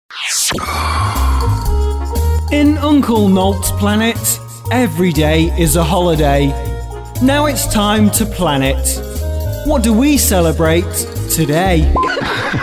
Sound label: music